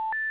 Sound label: Beep